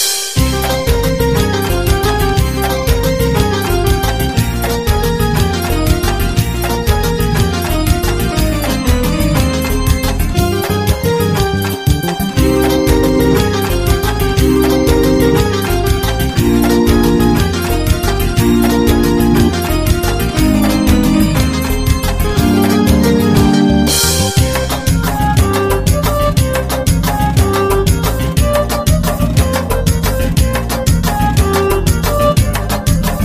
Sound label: Melody